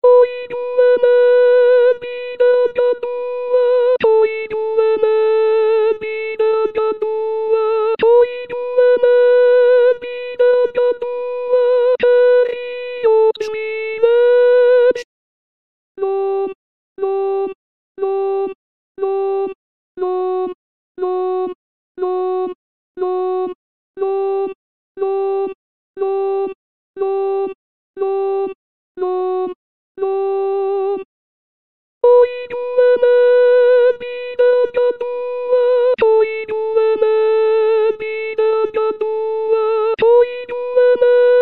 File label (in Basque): oi gu hemen alto